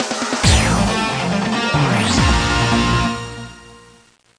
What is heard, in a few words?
Jingle; Street Fighter IV Volt